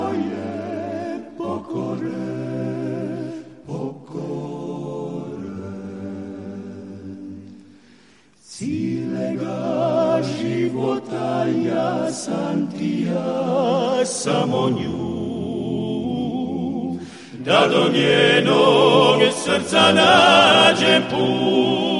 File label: Acapella